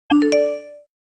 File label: message